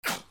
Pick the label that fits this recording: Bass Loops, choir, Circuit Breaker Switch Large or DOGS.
DOGS